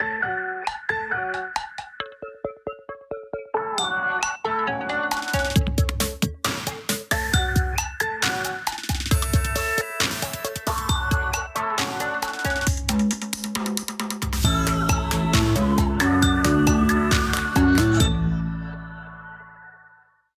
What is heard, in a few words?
Cricket Ring; LG Fortune M153 Cricket; ringtones